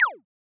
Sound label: shooting